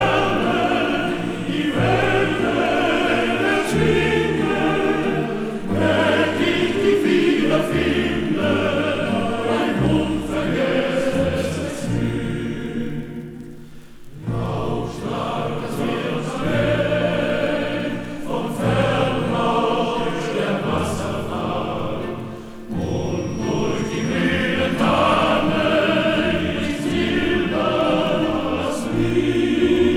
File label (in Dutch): Koor gemengd